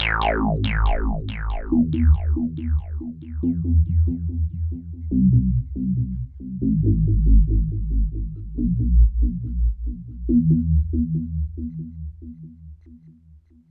synth tekno 140 A; Techno2; Keys

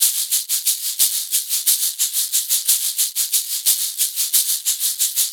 ETHNO EXTRA 90; ethno2

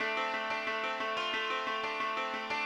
ethno, 90, D

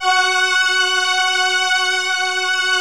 VP330

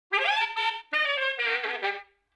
OLDSCHOOL BRASS 96

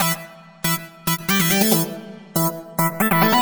ELECTRO SYNTH 140 A; Keys

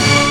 HIT

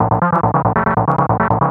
TECHNO SYNTH 140; Keys

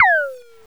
TOM; KillerTweaksII; DirectWave

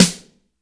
SD HIGH, snare drum; SNAREDRUMS

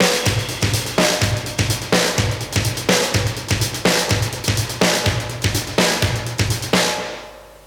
125 Classic Breaks, 125

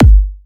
kick, drum hits; Electro; Anthemic

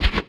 Hat; Touch Loops; BlissedOutBeats; One Shots; Drums

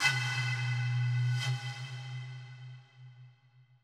bottle, ethno, 125

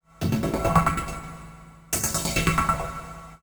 TECHNO 140; Techno2

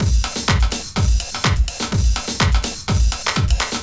DEEPHOUSE BEATS 125

